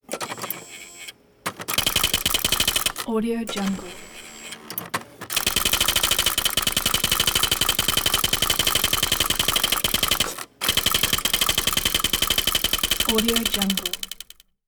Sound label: dyna dx15 computer printer servo whine clacking